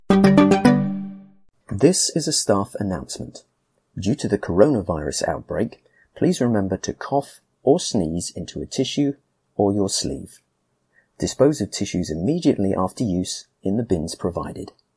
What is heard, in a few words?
cough sneeze with jingle male voice; Coronavirus